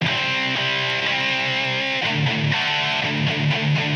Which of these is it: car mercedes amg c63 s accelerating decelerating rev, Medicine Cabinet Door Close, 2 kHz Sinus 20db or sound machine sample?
sound machine sample